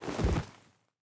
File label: Roll; SandBox Musics